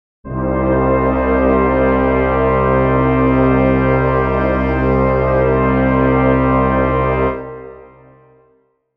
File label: brass chord c minor